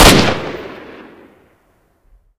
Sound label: ak47 shoot; weapons; garrysmod